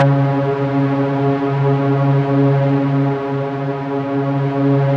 C#3, Yamaha VP-1; Music Store; 90's Sample CDs

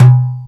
congas, ragga & dub reggae; USB Soundscan vol.27; AKAI; BONGOCONG

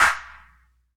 CLAPS HIP, CLP; Best Service Dance Mega Drums

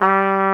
warm trumpet G2; InVision Interactive; Lightware VOL-1; Instruments & Percussions

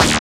LAZER; Rap FM Perc; Rappin Perc

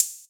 Hhat, HiHat; Equipped Music; Loungin House

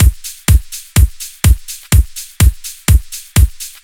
Tight Funky, Drum Loops, 125 BPM; Sonic Foundry (Sony Creative Software); Underground UK House & Electro

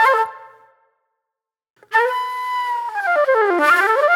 Flute, Disco

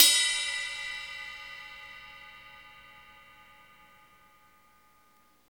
CYM, Rides; Roland - Rhythm Section; Ride Modules

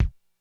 Kick, Korg DSS-1 Drums

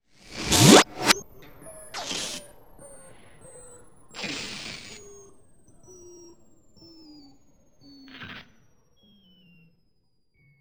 BUTTON; Best Service; Advanced Mega Effects

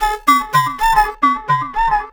Flute Effect, effected flute; Sonic Foundry (Sony Creative Software); Jazz Solos and Sections

